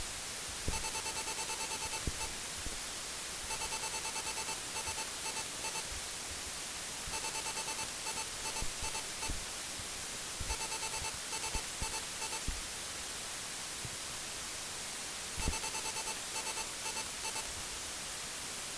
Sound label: Samsung Remote